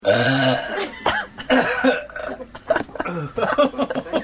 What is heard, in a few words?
burr, belch